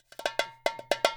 FILL R, Percussion; InVision Interactive; Percussion Slam

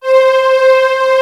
PAD String; Best Service Gigapack 1

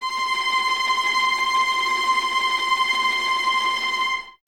Violas Tremolo C 5, String Ensembles; Miroslav Vitous

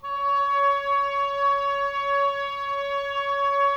OBOE C#5; Woodwinds; Sample CDs; Miroslav Vitous; Woodwind Brass Ensemble; Banks W&B

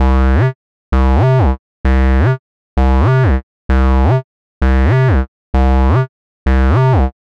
130 C bass, bass loops, trance; Trance Explosion Vol1

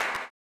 CLAP, blues & soul; ILIO; Vocal Planet

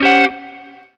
Guitar, Drum N Bass; Sonic Foundry (Sony Creative Software); Knutrix Drum & Bass

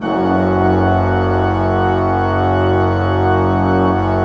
PLENO F1, Cathedral Organ, PEDAL PLENO; Propeller Island

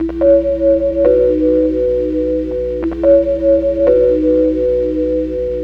160 Am, Keyboards; Total Drum&Bass; 90 s Sample CDs

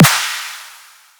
snare, snare02; M8

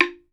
BAMBOO, World Assortment

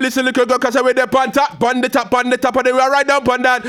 ragga in B phrase

